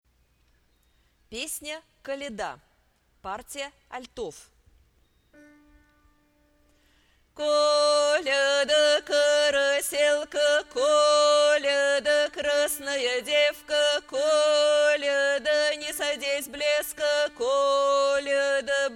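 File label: kolia khor alto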